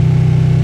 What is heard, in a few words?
Vehicle Motorcycle Run